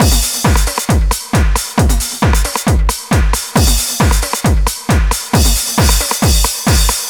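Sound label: drum loop 135, right; House; Best Service ProSamples vol.10 - House; AKAI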